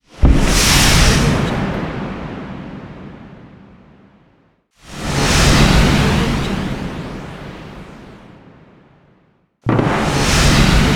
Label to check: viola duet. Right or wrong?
Wrong